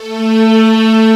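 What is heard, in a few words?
strings A3, YAMAHA, Analog Fantasies; USB Soundscan vol.49; AKAI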